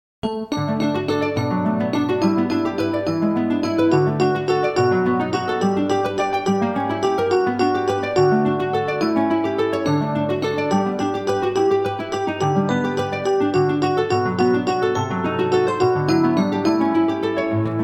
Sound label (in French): Le temps des cerises Instrumental